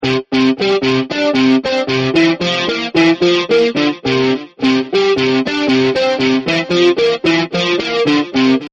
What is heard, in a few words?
guitar riff